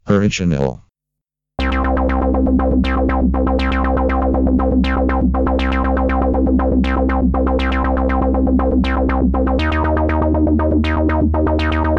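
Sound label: MS20 Original Mini Legacy Sequence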